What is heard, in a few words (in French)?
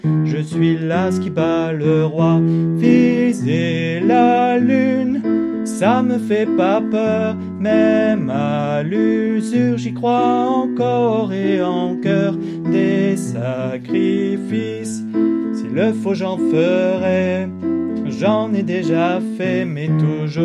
Refrains Voix